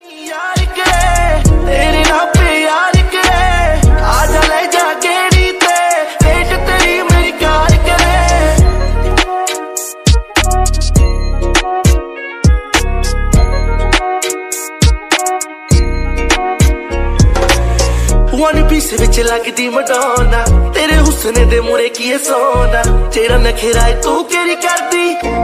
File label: punjabi song ringtone